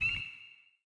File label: frog; quake2; jitspoe